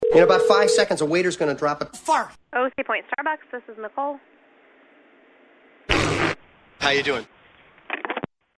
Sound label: 5 Second Fart